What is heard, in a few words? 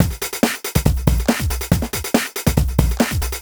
Break Beats, 140 BPM